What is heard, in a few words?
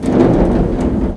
door move; scmod; doors